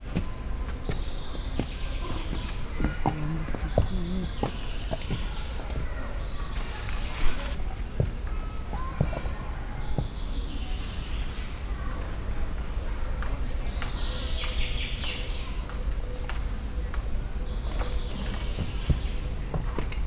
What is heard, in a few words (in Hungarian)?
patadobogás, magyar parlagi szamár; szegedi zoo 2011